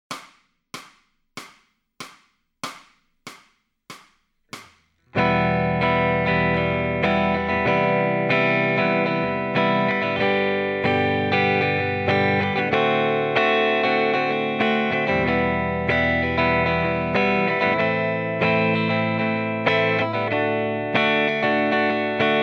Lead Backing Track, electric guitar

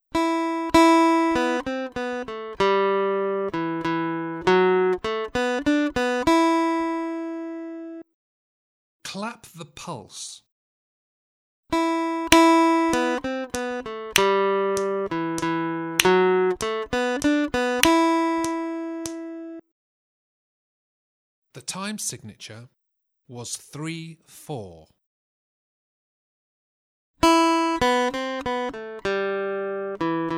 Aural Rhythm Test, acoustic guitar; Grade 3

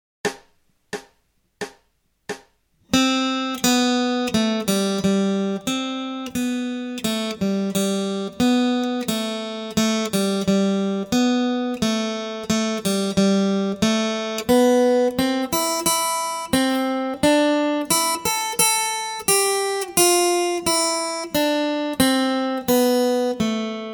Acoustic Accompaniment, acoustic guitar

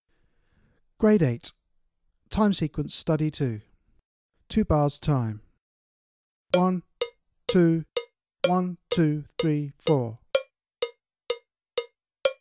Click Track for Time Sequence Study, drum kit

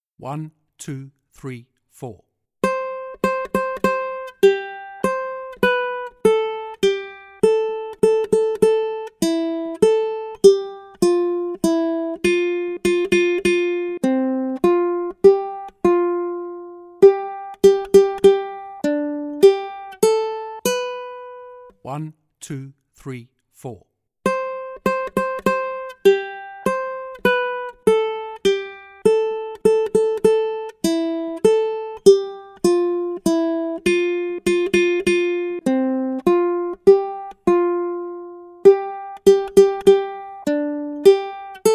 Accompaniment, ukulele

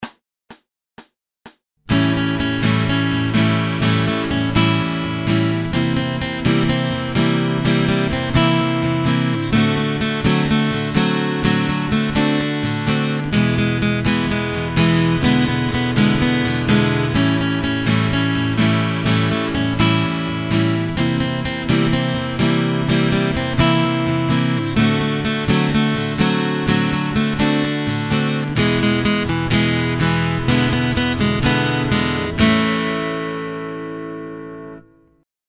Acoustic Accompaniment, acoustic guitar

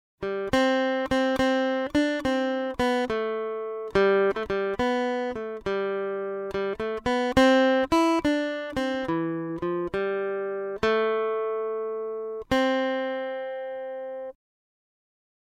Aural Rhythm Test, acoustic guitar; Grade 5

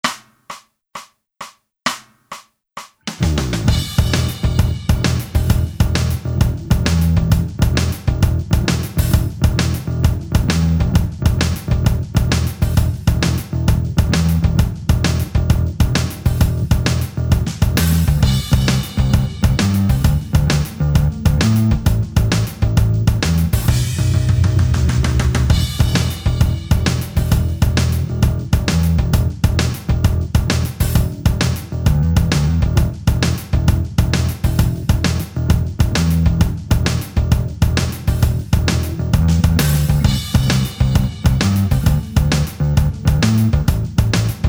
Rhythm Guitar Playing Backing Track; electric guitar; electric guitar performance diploma

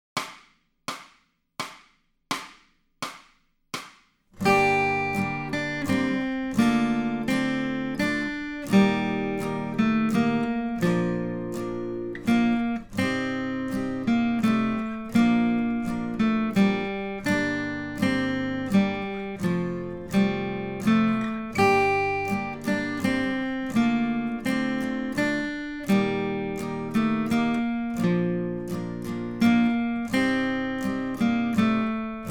Accompaniment, acoustic guitar; Grade 5

